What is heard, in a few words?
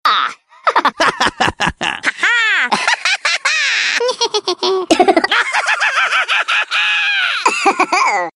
laugh ringtone, funny; worms